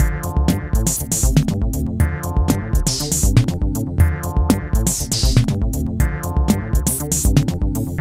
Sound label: Electro